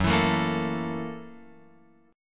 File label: Gb 7th Sharp 9th Gb Guitar Open F; strummed; Gb7#9; JGuitar